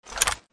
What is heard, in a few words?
potato cannon switch